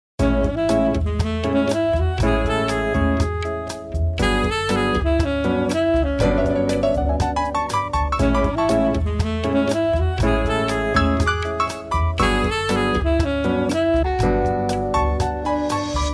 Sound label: Elevator Music